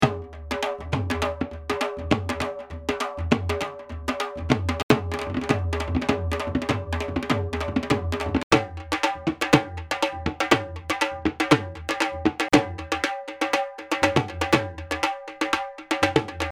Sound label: leather dhol